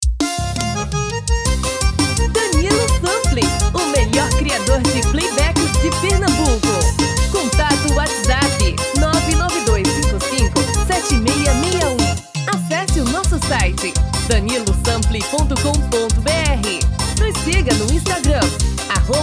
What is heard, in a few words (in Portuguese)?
arrasta pé tom feminino